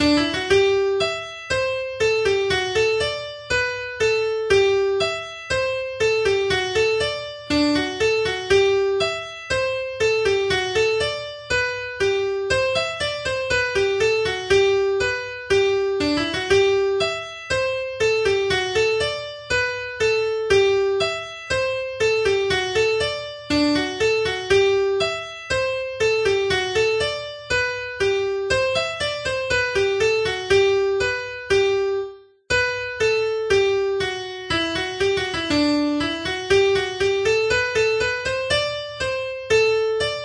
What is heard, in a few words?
Hornpipe; Staffordshire Hornpipe